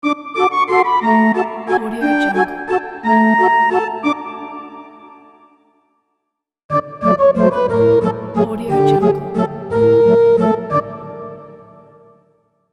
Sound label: circus